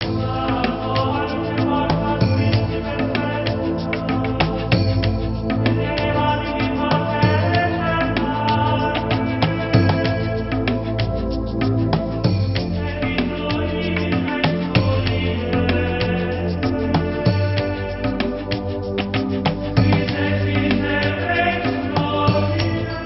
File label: meditation mix